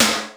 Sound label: SNARE, DRUMS; Crypton - DrumBase5000; T1 DRUMS